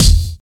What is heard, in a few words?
natural bass drum one shot E key; kick drum samples